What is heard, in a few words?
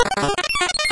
ball hits hole, golf